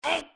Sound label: hurt